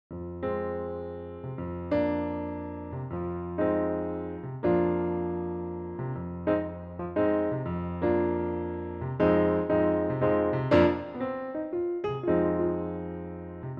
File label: FM tuner piano; TDMA Test Files